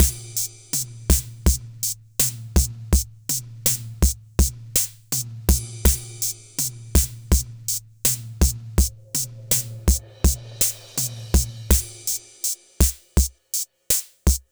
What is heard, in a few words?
Sunrise Drumz Dry, Lounge; Zero-G Kocktail Kollection; Construction Kitz (Lounge); Sunrise Mixes 082